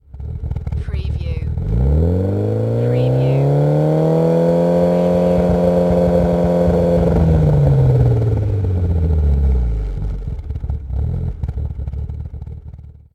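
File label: MITSUBISHI EVOLUTION EXHAUST LONG REV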